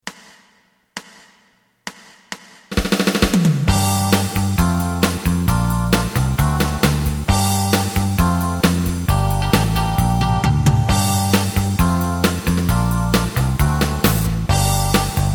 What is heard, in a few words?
lead